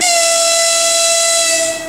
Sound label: horn, vehicle